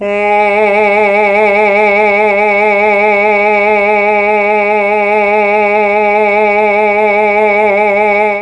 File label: G#2 CHAMB MA, Mellotron, MALE VC; Mike Pinder - Mellotron Archive CD; CHMB MALE VC